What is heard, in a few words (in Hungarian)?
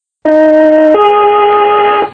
szignal; subway announcers